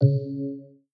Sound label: Unlock